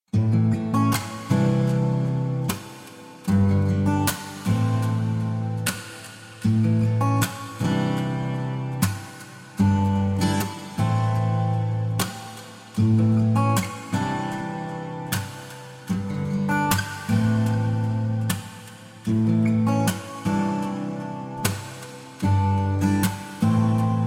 Guitar Relaxing Guitar

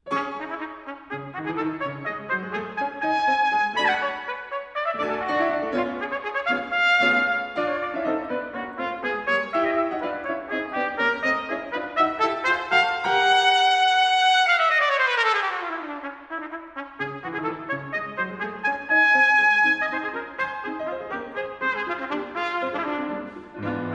Triptyque for Trumpet and Piano, Scherzo; Tomasi

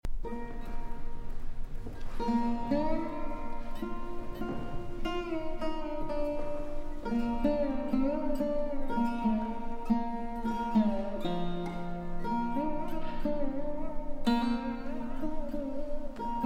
Taqsim and Narration

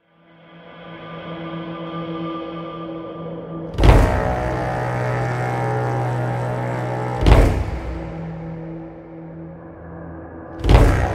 When Horns Resound

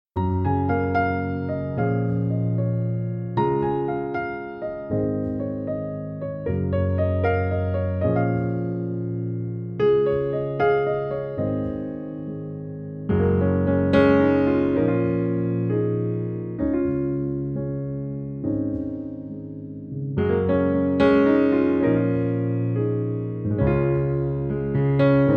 Piano Version

